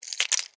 Insect Claw Arm, Animal, Insect, Claw, Arm, Reach, Snap, Movement, Bug